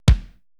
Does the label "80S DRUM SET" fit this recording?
Yes